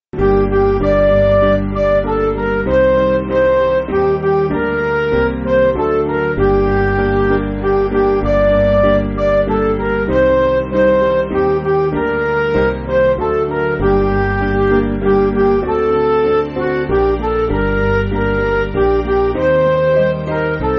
Midi

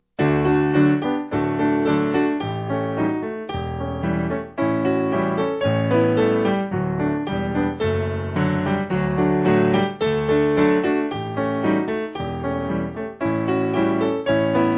Piano

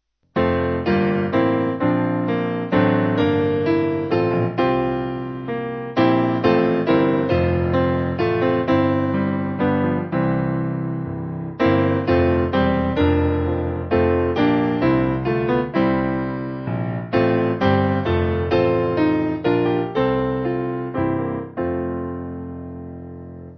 Sun Of My Soul, Piano; Abends

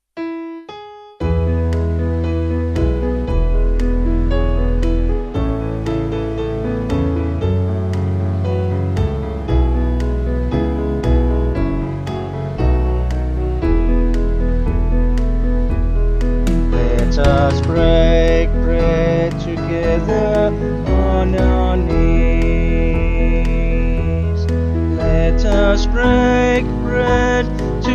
Vocals Band; Let Us Break Bread

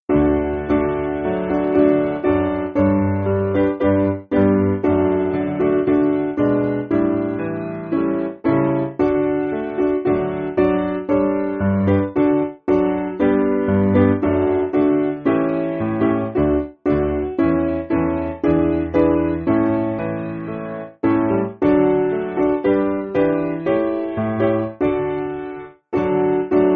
Piano

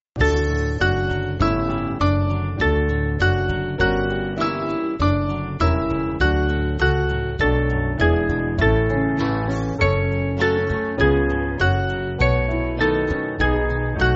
Band; Saw You Never; The Wise Men

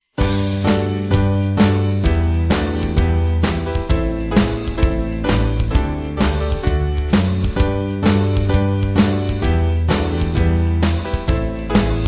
You Are Crowned, Band

